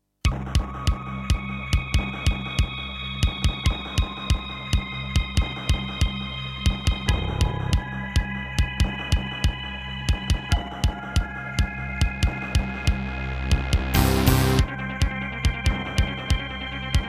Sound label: Aztech CS9233